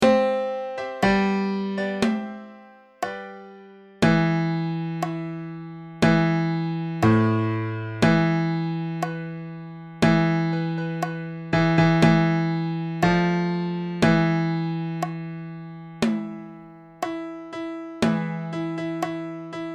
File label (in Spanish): basso, midis